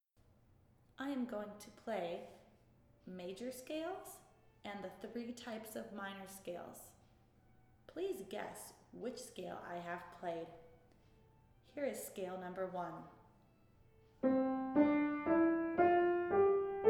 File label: ear training major minor scales